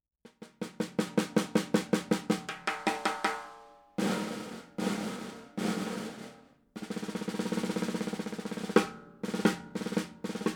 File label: Gretsch 5.5x14 Anniversary